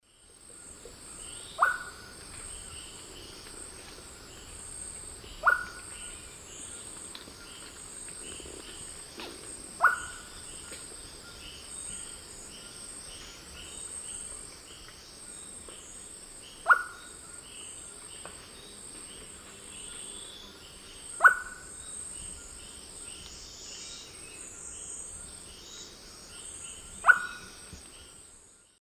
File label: Chamaeza nobilis, call; S. America; Eastern Lowlands